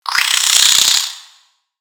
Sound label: Spider Angry; Lego; aronwk collection; ndaudio; creatures mechanical